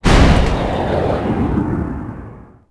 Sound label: Jet Hurt; Civ4